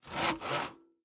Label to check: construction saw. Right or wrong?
Right